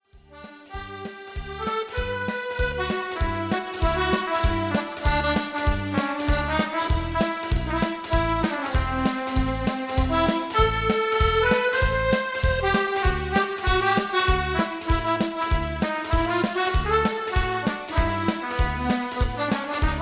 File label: quickstep medley